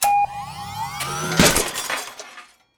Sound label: Ping Break; Wulfrum